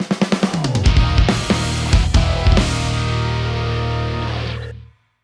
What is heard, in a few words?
Win Jingle; Event Battle - Atomic Boo